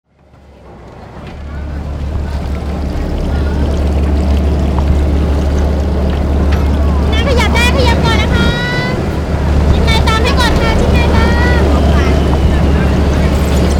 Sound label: BOAT